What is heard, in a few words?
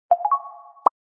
alarm